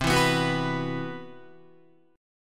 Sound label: C Major Sharp 11th, Guitar; strummed; JGuitar